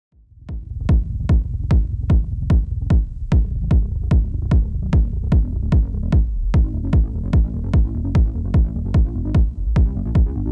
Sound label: Beat